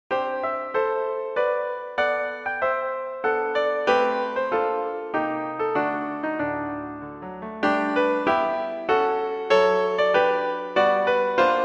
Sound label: MENUET; pianisterie